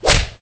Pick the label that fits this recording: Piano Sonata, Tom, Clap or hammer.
hammer